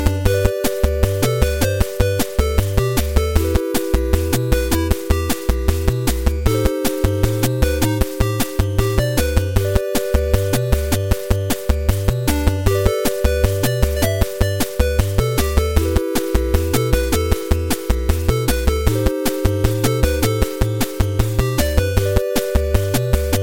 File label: 8 Bit